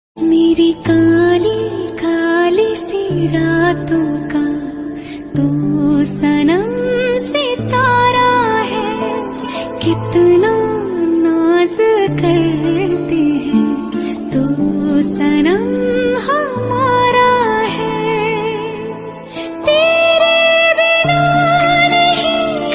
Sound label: Love Song Ringtone